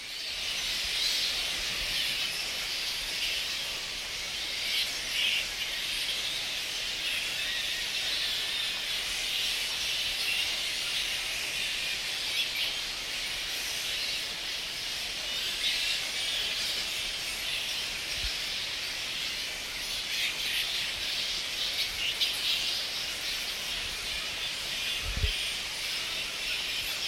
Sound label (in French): Australie Maratanga Chauves Souris